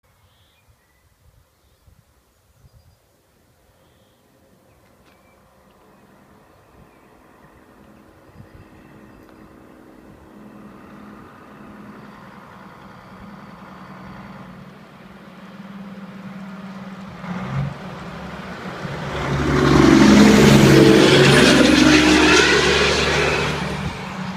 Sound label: Volvo FH 16 Sound